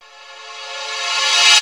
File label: Uplifter